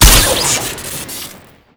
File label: harpoon shoot crit; weapons